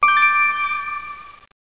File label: TINGLE; The Search for Ancient Wisdom